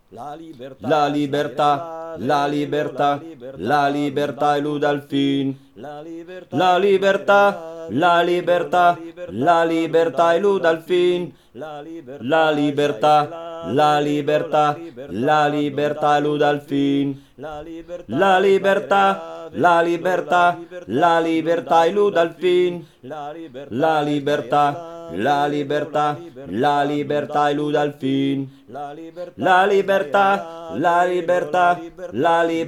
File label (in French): finale soprani; Joan Peirol